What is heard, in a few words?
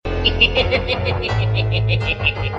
nyehehehe